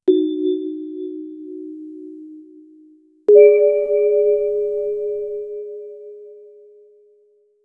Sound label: join ping sad lost, negative feedback